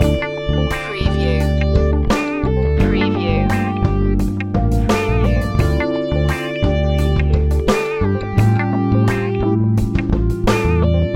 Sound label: havanna drive loop